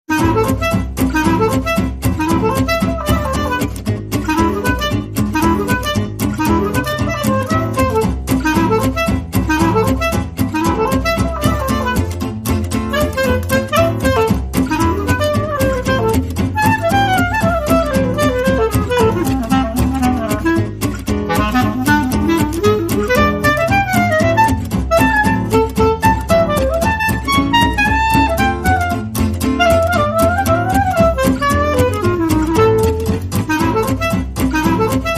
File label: No Drums No Studio Noise